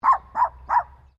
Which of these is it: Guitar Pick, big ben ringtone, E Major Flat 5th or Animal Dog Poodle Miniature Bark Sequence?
Animal Dog Poodle Miniature Bark Sequence